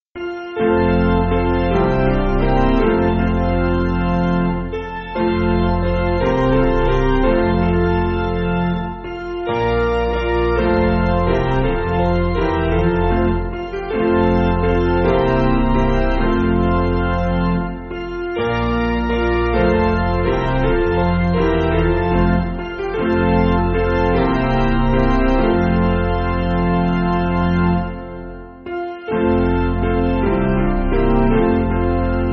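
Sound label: Piano, Backing Files for Churches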